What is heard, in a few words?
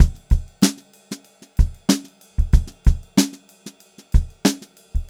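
STRAIGHT VARIATION, Funk-Groove Drumloops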